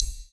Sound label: panorama rollover micro; ui